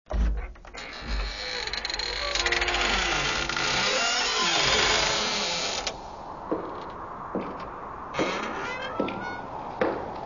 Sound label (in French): pas grincement porte vent entree